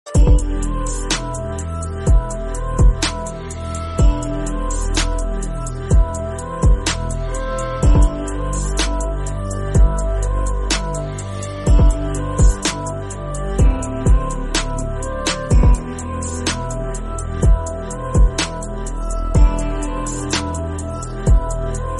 soundtrack; cast away

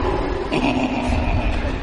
wolf ghost sound notification

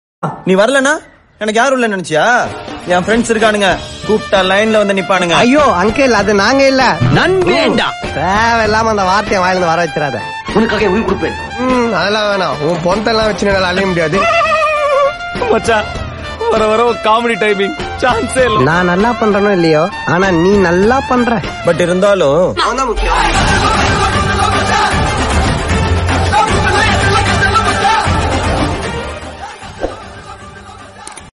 bgm tamil

